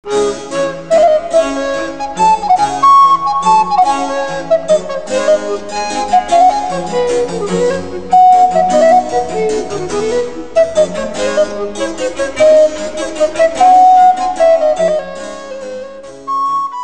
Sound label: Recorder